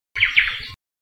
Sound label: omao call; Saddle Road Birding